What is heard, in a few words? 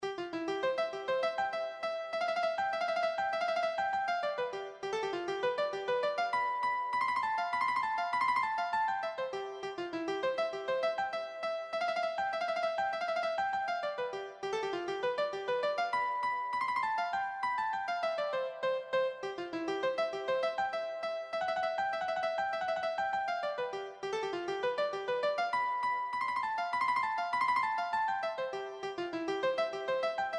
clarinet polka, keyboard